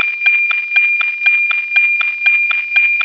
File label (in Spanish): noaa, satelites